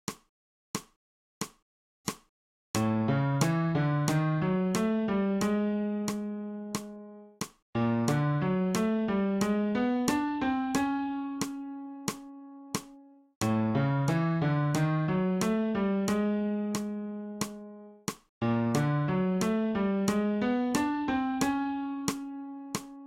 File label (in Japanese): 新フレットレス